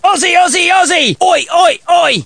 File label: aussie oi chant